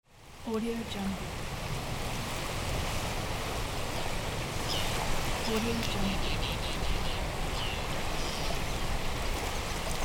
sea waves

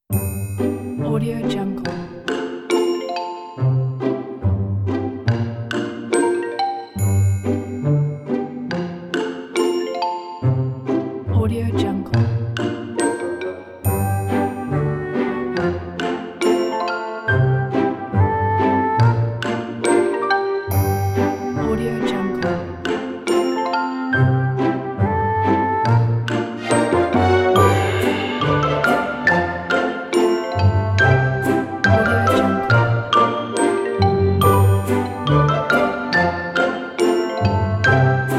comedy orchestra

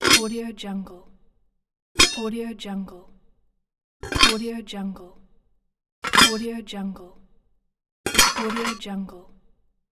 metal lid

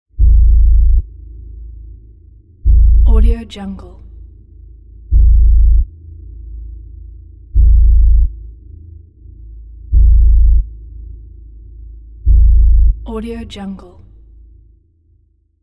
bloody nightmare horror pulses; soundmorph

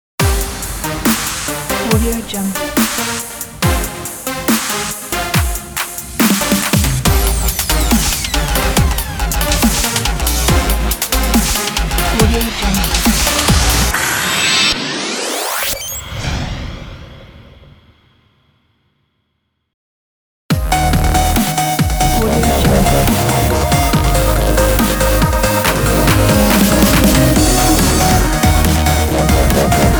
edm dubstep logo; edm dubstep logo pack

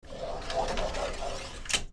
door louvered bi fold open with slide; hollywoodedge

